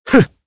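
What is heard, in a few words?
Vox Landing Japanese; Kain; kings raid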